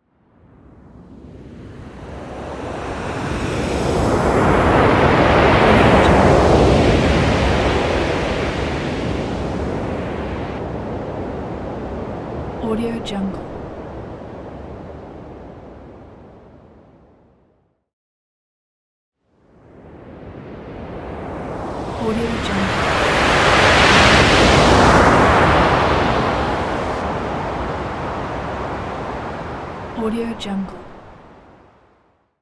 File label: planes landing; pure-noise